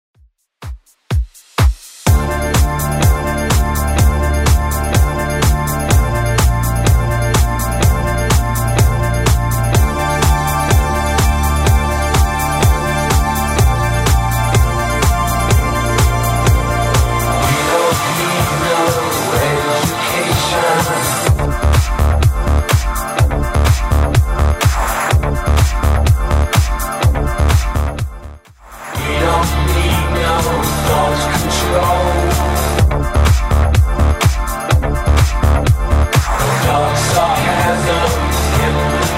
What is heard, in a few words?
90s Redrum 126bpm